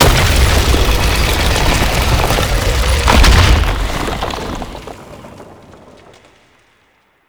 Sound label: Exit Door; Duviri Murmur; Oraxia